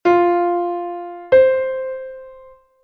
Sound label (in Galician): 5ª Xusta FA-DO